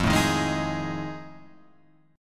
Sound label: F 7th Sharp 9th, Guitar, Open F; F7#9 strummed